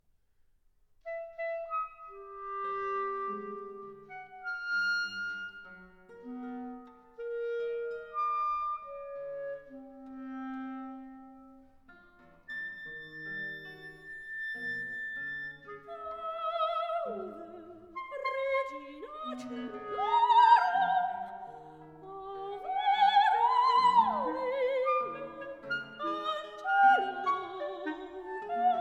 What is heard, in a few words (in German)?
gesang es klarinette und gitarre